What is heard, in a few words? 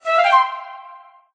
cannot move; ufo defense